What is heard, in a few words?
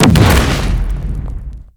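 grenade, impact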